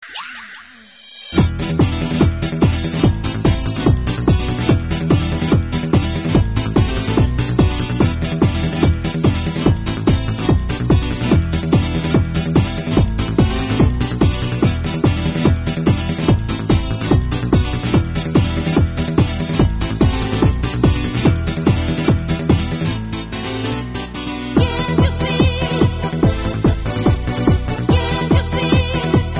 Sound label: spanish guitar mix